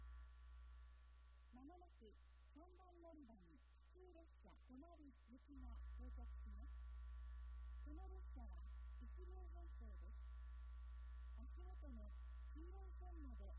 local tomari, itoigawa station; echigo-tokimeki-railway

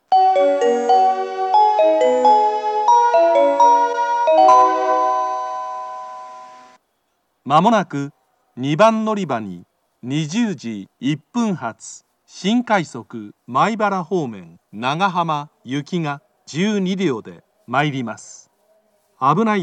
special rapid service nagahama, kyoto, station

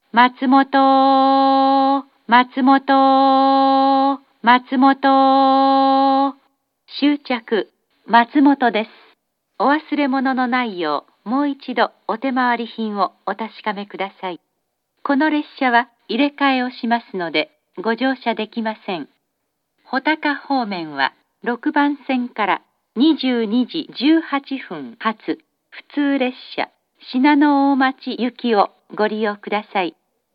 matsumoto stop, station; jr-east

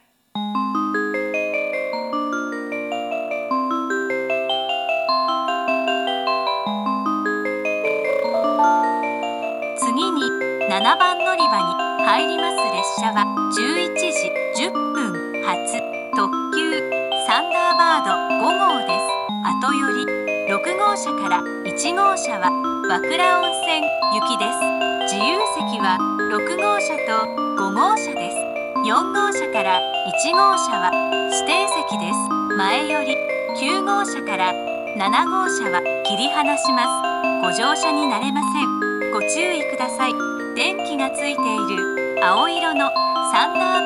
kanazawa limited express; thunderbird5; wakura-onsen; jr-west

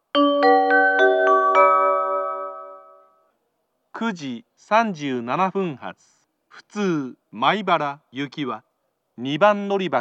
local, kyoto station; maibara

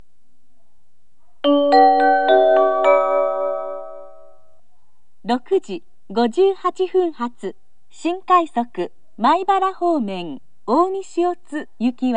sannomiya special rapid service; omi-shiotsu

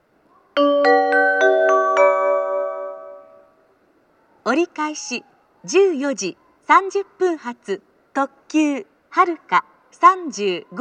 turn limited express, kyoto station; kansai-airport; haruka35

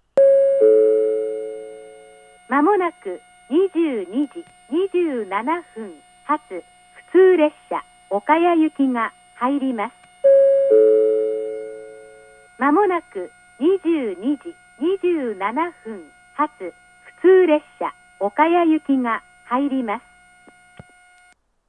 up local; kawagishi; okaya; jr-east

